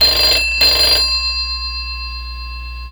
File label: english ring